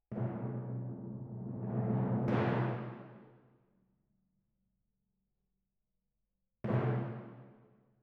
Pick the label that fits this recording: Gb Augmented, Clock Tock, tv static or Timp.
Timp